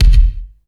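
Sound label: EFEX bass drum, FX DRUM; AKAI S6000 CD-ROM - Volume 1; DRUM RYTHM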